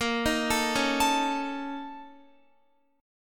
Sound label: A sharp Minor Major 7th Double Flat 5th A sharp Guitar; A#mM7bb5 arpeggiated; x,13,13,14,13,16